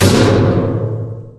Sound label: metal grate impact hard; physics; Garry's Mod; hl2